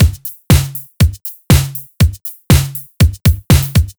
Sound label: 120 Analogue Drums; Zero-G; Soundclash Nu Dance Electro; Combi Loops; 120bpm